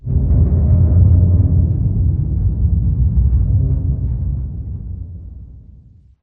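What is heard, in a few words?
Giant Pillar Distant Rotate low frequency effects; Titanfall 2; Boomtown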